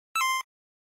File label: Sound Menu Game Start